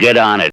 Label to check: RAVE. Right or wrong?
Right